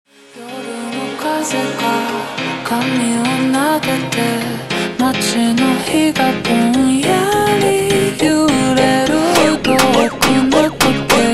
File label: Jumpstyle ultra Slowed